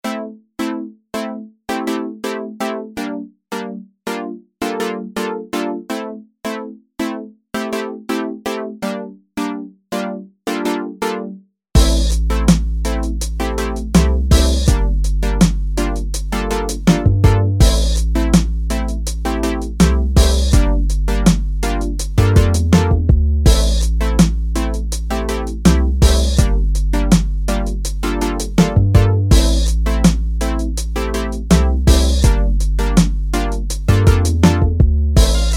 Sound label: Instrumental